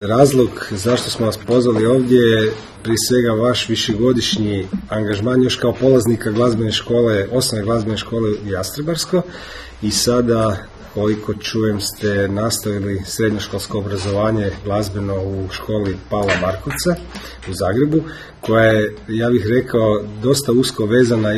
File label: SAX